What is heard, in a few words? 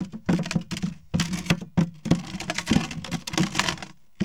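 BOX RATTLE, Box Noises; E-MU Producer Series Vol. 3; Hollywood Sound Effects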